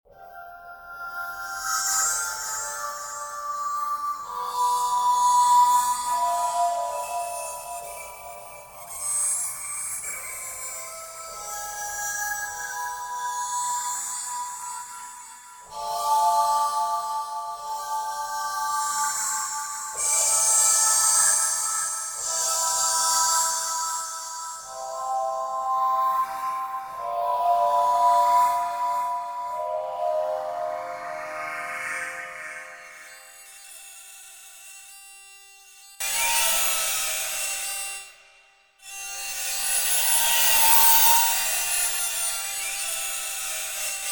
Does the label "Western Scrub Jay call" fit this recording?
No